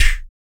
VOC PERC, Vocal Planet Jazz FX BASS PERC; Spectrasonics Vocal Planet

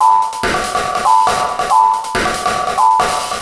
E Kit, Drums & Percussion, Electronica

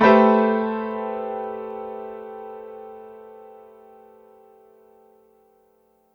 Piano stack (A) min; Sonic Foundry (Sony Creative Software); Ugly Remnants VOL-2

